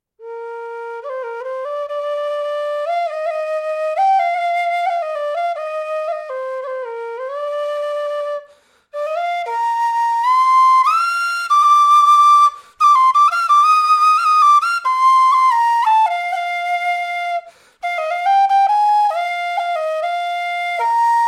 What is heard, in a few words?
Air